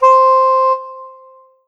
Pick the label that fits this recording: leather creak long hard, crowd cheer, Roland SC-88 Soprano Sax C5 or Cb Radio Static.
Roland SC-88 Soprano Sax C5